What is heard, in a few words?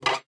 GUITAR FX; Xsample VOL-2